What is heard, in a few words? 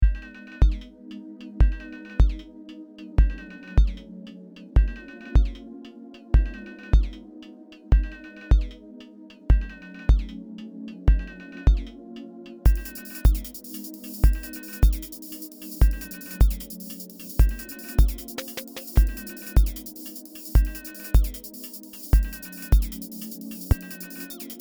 Jam3 Volca Beats